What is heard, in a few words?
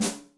Dry Guy, Electric Snare, Yamaha DTXpress